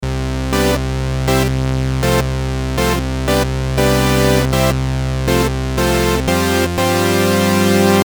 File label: Env Syn Brs